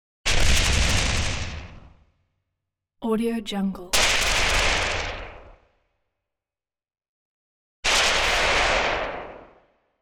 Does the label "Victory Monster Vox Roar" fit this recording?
No